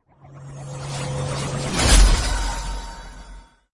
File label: hero breach